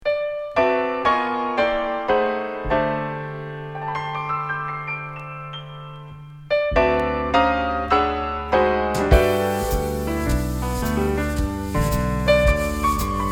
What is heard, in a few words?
Trio